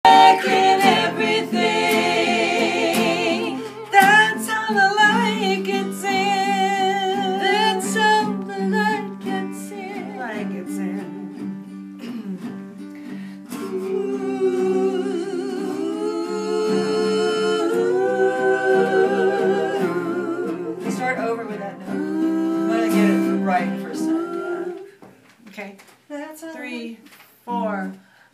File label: Anthem Ooooooos